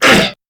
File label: Pain Crewmen; Warframe; CorpusCrewmen; CrewmanA